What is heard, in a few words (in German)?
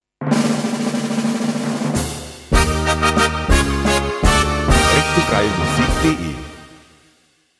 Tusch Karneval Wirbel